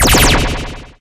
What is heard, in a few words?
killsound vortex